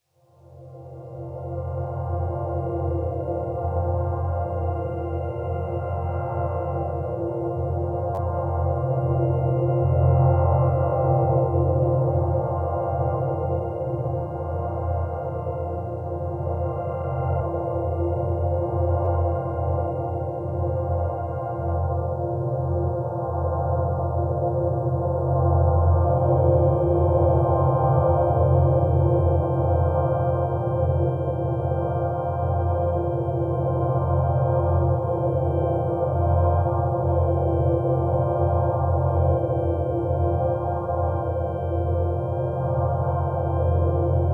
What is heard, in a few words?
Forest Drone